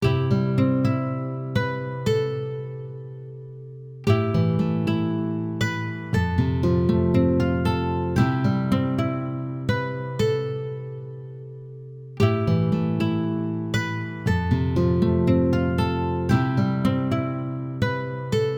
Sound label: Horse Racing - Race - Result; mario sports superstars 2017 3ds gamerip